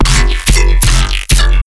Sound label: Sound FX Error